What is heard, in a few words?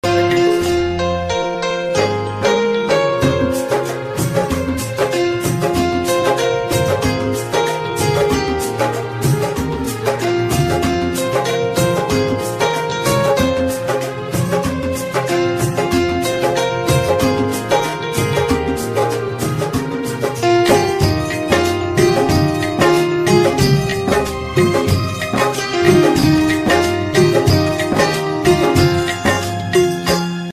mobile ringtone instrumental ringtone